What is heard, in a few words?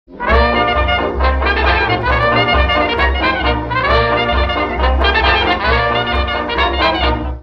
big band